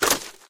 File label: fire step; Golden Crayon Sounds